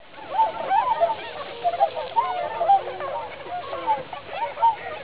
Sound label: cygnus bewickii